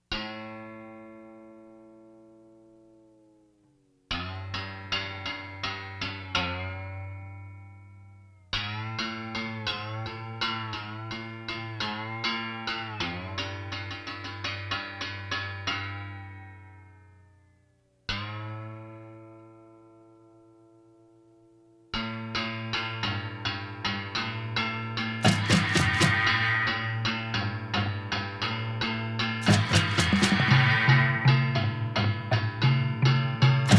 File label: musical bow